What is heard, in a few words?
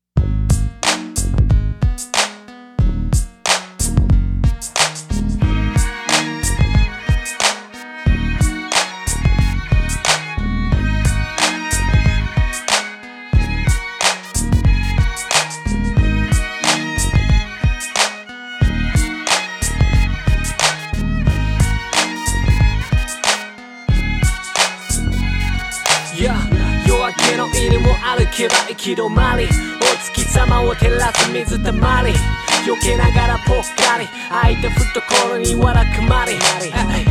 rain vocal; 日系Hip Hop